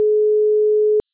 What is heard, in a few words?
ringing; MicroSIP Portable